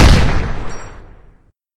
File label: rifle fire, weapons